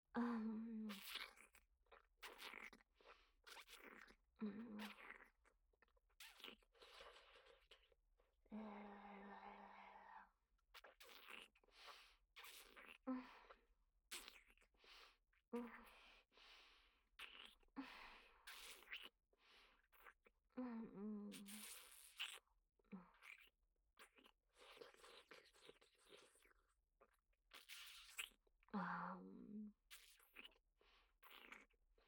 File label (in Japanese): フェラ, ASMR; アドリブ集